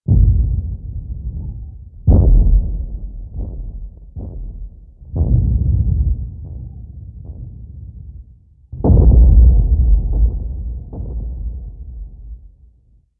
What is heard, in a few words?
Amb Destruction; Red Orchestra Ostfront 41-45